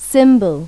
CIMBALO; Pipo-Aprende musica con Pipo

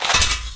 paso tierra; Severance

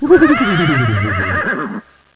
caballo; Pipo-Aprende musica con Pipo

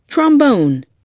TROMBON

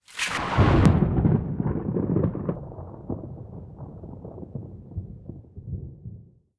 trueno

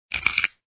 se agota talco; Ataque de Esporos

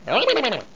fallo

